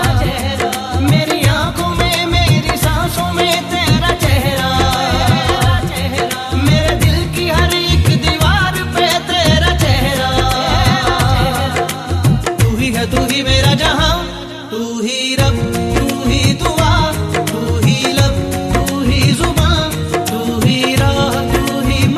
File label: Romantic Ringtone